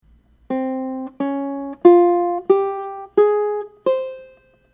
Japanese In Scale, ukulele

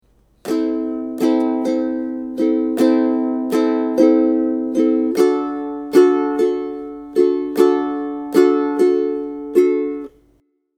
Strum, ukulele

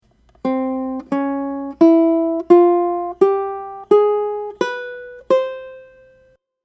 Arabic Scale, ukulele

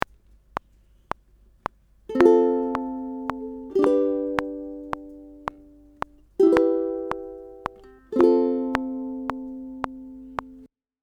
Strum, ukulele

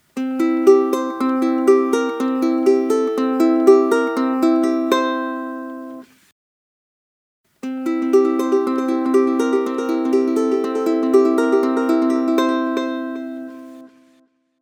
Delay, ukulele

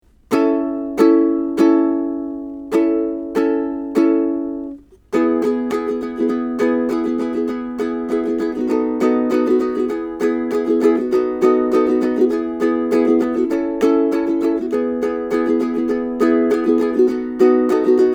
Verse Strum, ukulele

